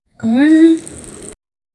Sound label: a quick bright satisfying, jingle